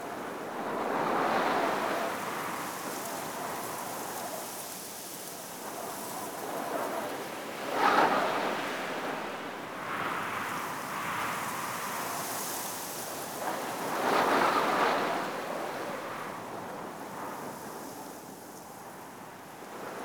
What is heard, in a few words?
Night Wind Grass Medium Loop; Ambience; Props; Warframe; Eidolon Plains